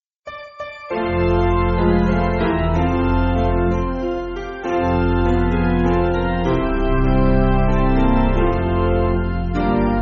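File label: Piano, church backing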